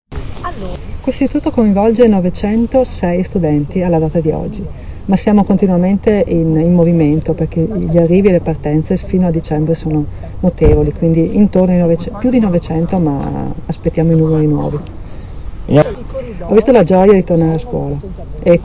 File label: viola